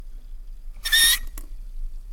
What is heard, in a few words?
Singing Water Tap